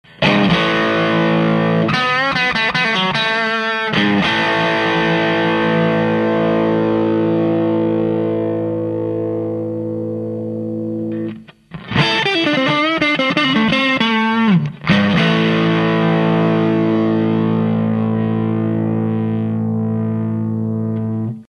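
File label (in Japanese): Volume Full Tone 0 Drive Middle Sound, 前半はストラトキャスターのリアPU 後半はフロントPUです; OLIVE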